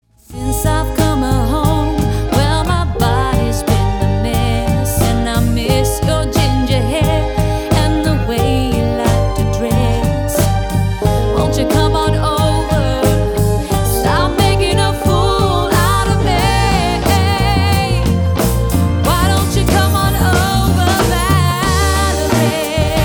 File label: Melodica